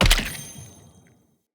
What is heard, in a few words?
Player Bow Headshot Kill; Weapon Impacts; Sweeteners